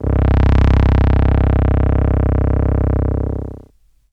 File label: MS10 Vinyl Synths C0; MS10 Chilling Ghosts; vinyl leads